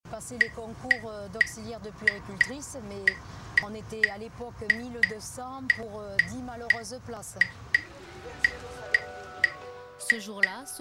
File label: clave